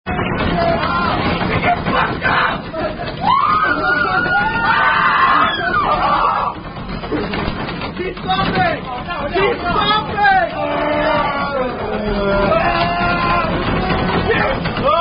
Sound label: Signs Indian Drinking Game; Thumper